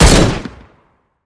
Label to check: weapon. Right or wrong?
Right